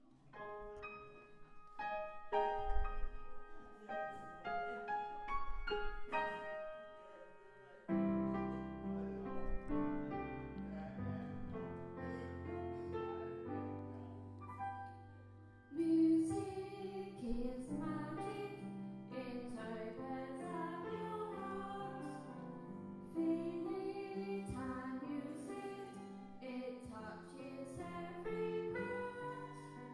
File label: Music is magic